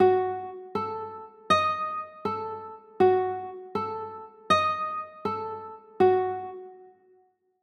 c dim7, chords

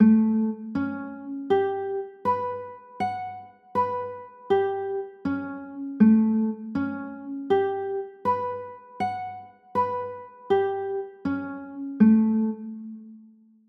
a 6, chords

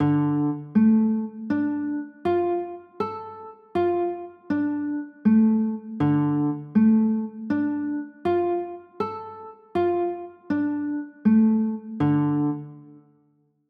d m, chords

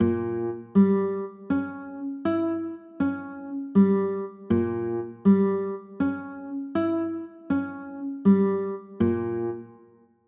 b flat dim, chords; b flat dim7

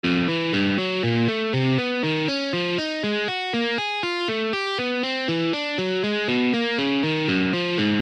1234 1 string skip, alternate picking exercises

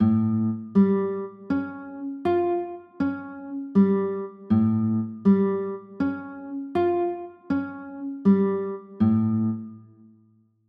a sharp 6 9, chords

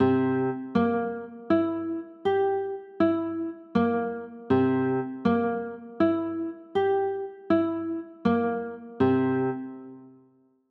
c 6, chords

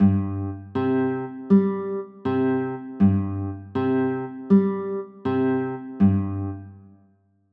c 6, chords

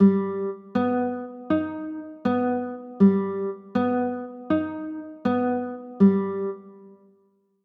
g aug

